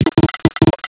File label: samba drum, percussion instruments; Sound Effects for Multimedia - Volume 1